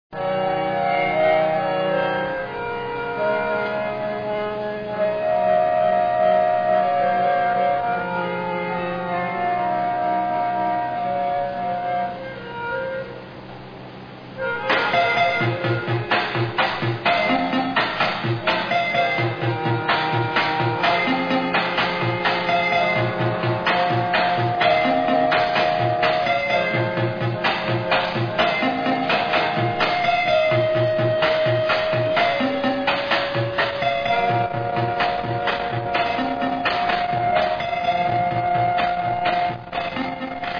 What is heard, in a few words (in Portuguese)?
orgão e batida